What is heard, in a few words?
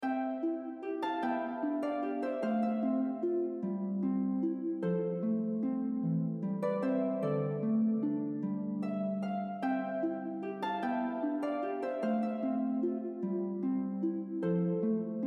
harpsound